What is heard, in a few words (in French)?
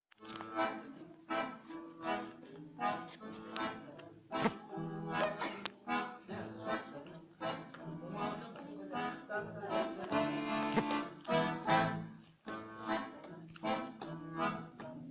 grille guitare; Musique d-ensemble